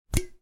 household whiskey decanter glass lid pull out remove